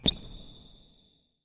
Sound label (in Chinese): 摩擦; nba